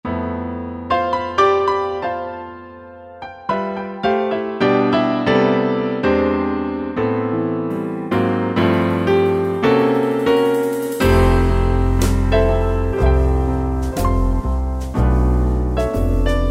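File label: Modern Jazz Ballad, Swing & Jazz; Factory Styles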